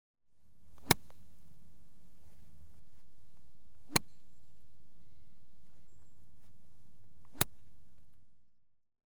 Golf - 3 chip shots; The BBC Sound Effects Library; Sport and Leisure

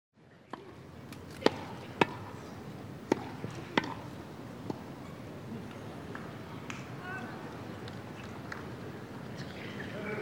tennis; The BBC Sound Effects Library

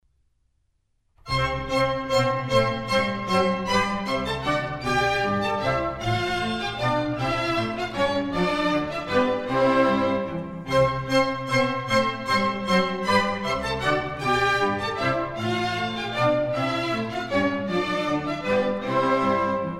Minuets, C Major; Mozart; Dances and Marches

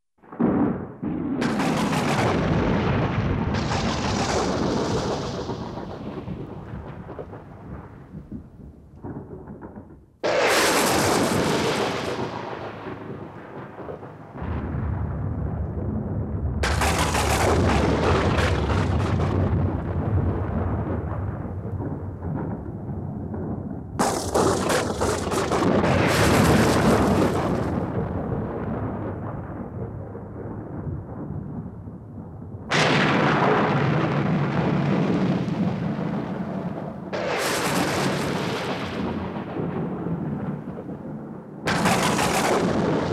rain, thunder, etc., Weather; The BBC Sound Effects Library; Frankenstein's Castle

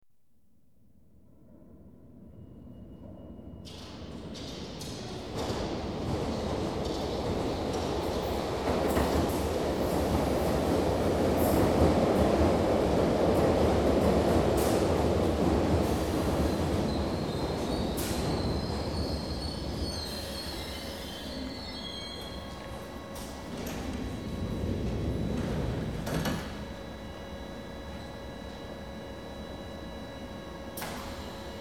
London Underground Trains; The BBC Sound Effects Library

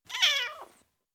Four Week Old Kitten, Individual, Cats; BBC Sound Effects Library

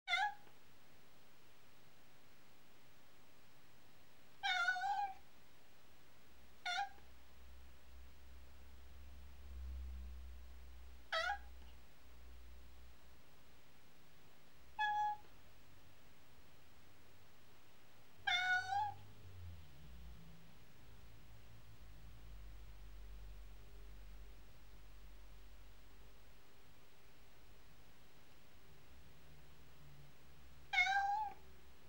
Cat miaowing; Animals & Birds; The BBC Sound Effects Library

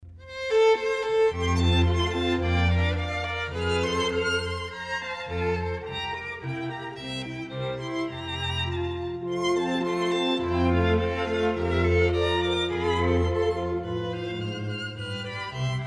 Divertimento in Bb Variation IV; Mozart; Divertimenti; St Martin in the Field's Chamber Ensemble

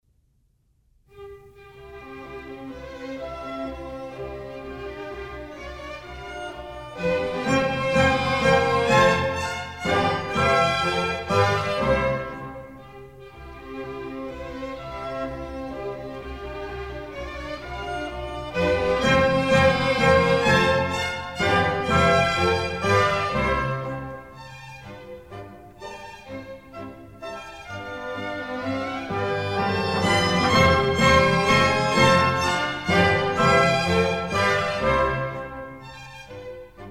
Minuets, C major; Mozart; Dances and Marches

